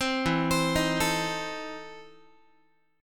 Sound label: E Major 7th Suspended 4th Sharp 5th, Guitar; EM7sus4#5 arpeggiated